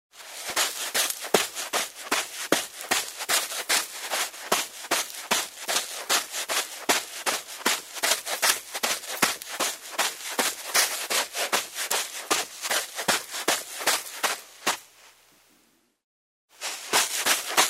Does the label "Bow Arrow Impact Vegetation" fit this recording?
No